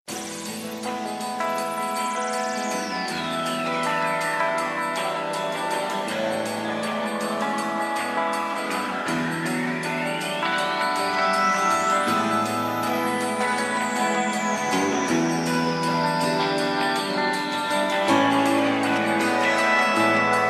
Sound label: nice and easy guitar